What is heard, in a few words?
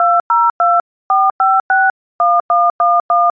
DTMF